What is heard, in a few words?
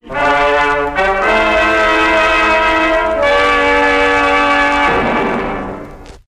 bold fanfare